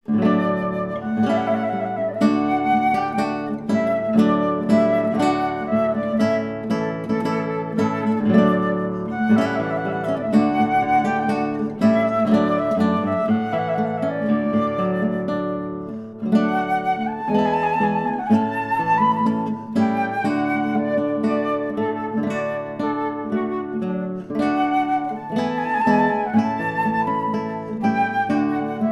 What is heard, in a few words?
trumpet volun